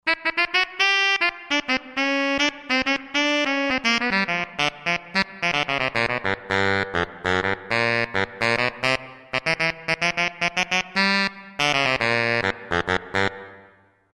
Tenor Sax, Roland JV-2080

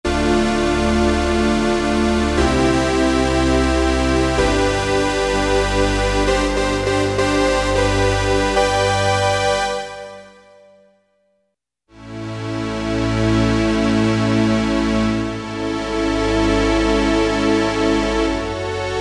Analog Strings; Greytsounds Philip Wolfe's Rock Keyboards

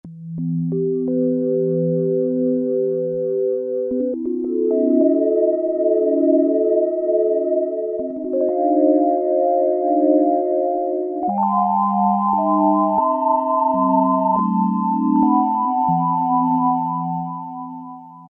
Space Chime, Roland Juno-60; Factory presets; Factory presets group 2

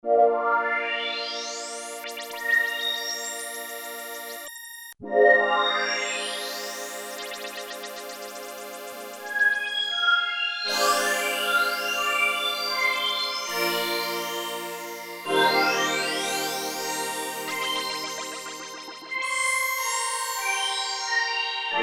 SAW MOD, Oberheim OB-8; Factory Patches

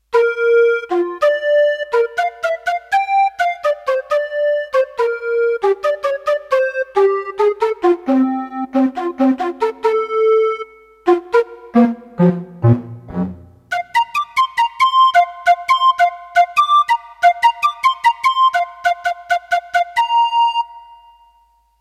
Blow Pipes, Roland D-10; Factory Patches

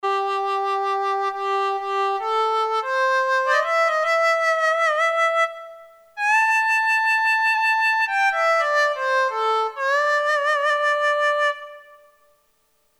HARMONICA, Roland Jupiter-8, Factory patches

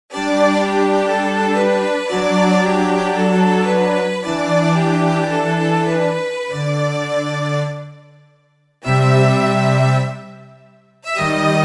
Camera Strings

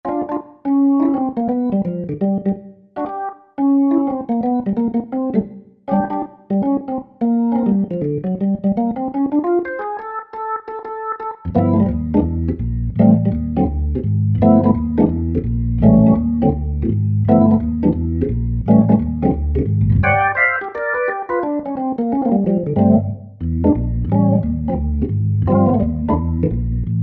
Absolute B3, Roland SRX-07 Ultimate Keys

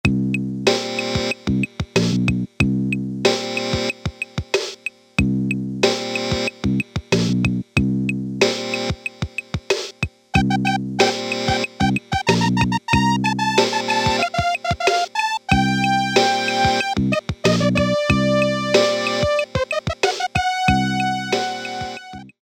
HIP-HOP 1, Casio Rapman rap patterns

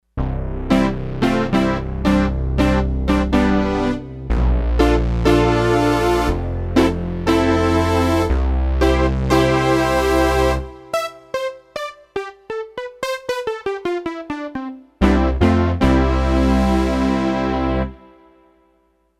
Velo-Brass, Roland D-10; Factory Patches

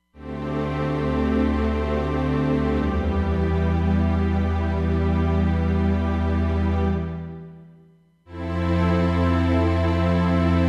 Strings

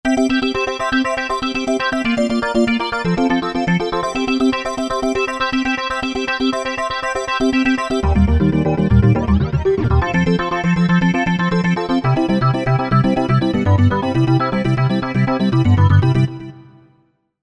Wave Sequence, synth

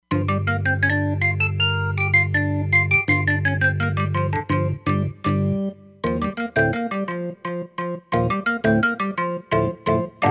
Perky Organ, Roland Fantom XR

